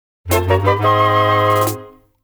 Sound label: Get Item Fanfare; legend of zelda the link's awakening